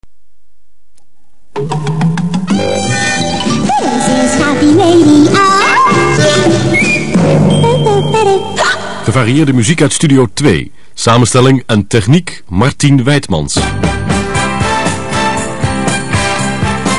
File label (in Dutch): tune Gevarieerde muziek uit studio